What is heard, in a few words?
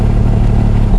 v8 stop